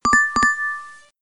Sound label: default notification